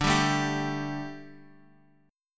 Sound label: D Suspended 4th D, Guitar, Open F; Dsus4 strummed; JGuitar